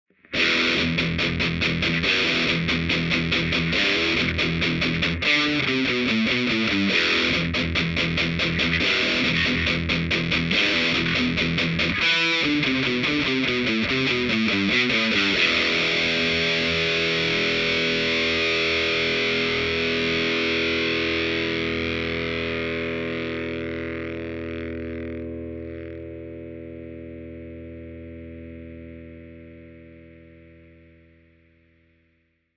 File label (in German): E Gitarre 45°; Austrian Audio OC7